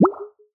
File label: menu; business